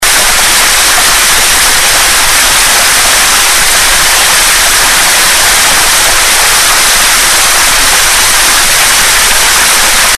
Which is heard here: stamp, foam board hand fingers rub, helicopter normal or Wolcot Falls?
Wolcot Falls